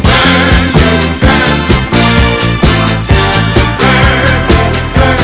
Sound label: disco